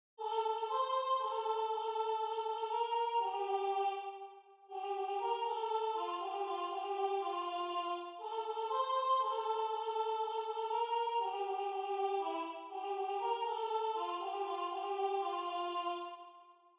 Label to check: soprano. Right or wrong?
Right